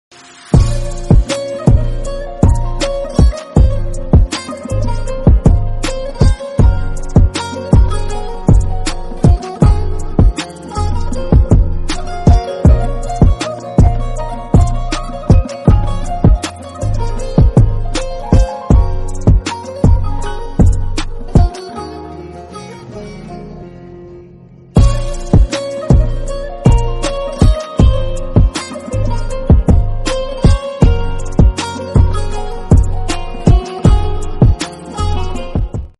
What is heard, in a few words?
turkish, instrumental bgm